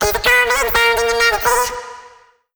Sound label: phrase Abm, Vocal; Slate Digital Omnivox Vocal Sample Pack